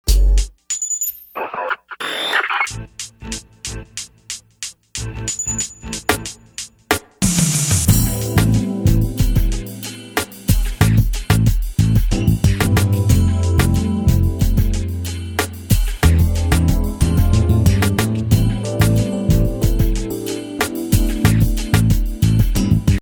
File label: Hip Chop Groove